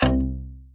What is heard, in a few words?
gameshow clock tick